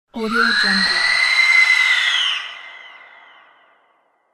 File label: monster scary hiss; fxprosound